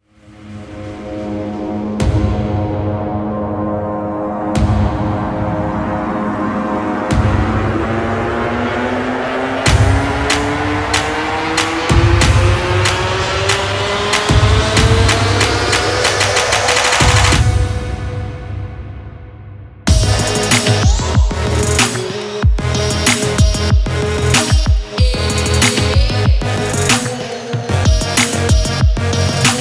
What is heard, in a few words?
Future Bass